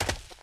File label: grass, footsteps survivor walk; Left 4 Dead 2